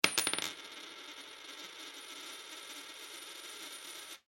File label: Foley Coin Quarter Drop On Counter Long; Money (Sound Effects:Objects)